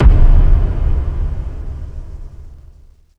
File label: Bass